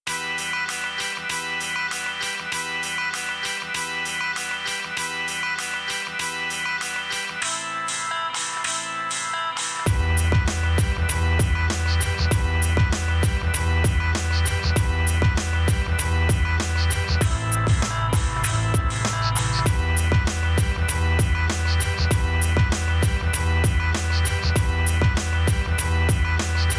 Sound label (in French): générique fin court